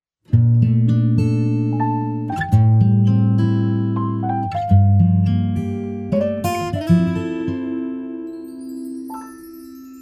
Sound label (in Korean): Acoustic ver, -1키